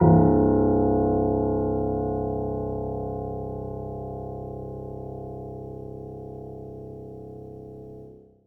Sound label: piano p c1, Grand Piano; Sonatina Symphonic Orchestra